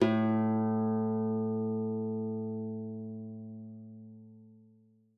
A2, GUITAR; Xsample VOL-2

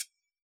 guiro short; Yamaha DJX; AnlgKit1

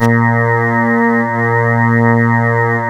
ORGAN, A#; Big Fish Audio; Synclav FM

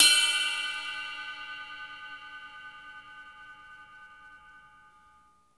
Drum Dry; East Collexion; Drum 1

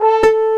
Fanfare; Mahou Kishi Reiasu (Magic Knight Rayearth)

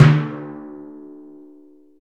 PRC TIMPAN, Timp Cresc; Roland LCDP03

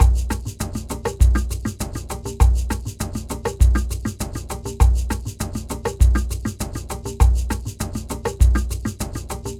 Ensemble, Latin Percussion

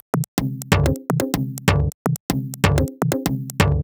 125bpm, House Drumloops; 90 s Sample CDs; Zero-G House Fabrik Drumloops; ZG Drumloops

